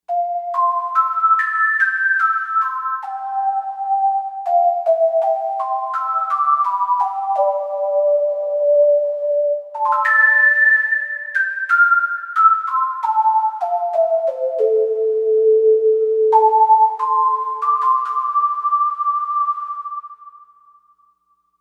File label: Puff Pipe, Novation UltraNova; Factory Patches